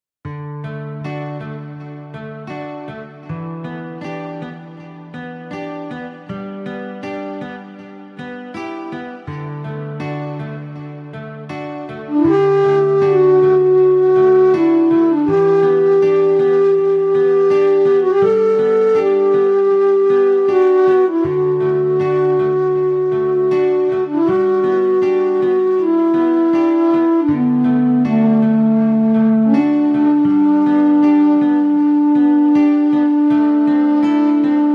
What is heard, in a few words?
Yoga Music